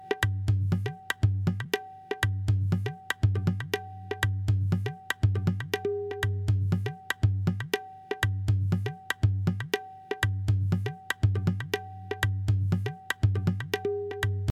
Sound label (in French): Fin Tablas